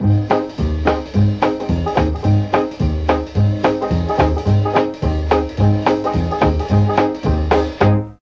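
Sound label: C3 trombone, dixie, mellotron; DIXIE+TRMBN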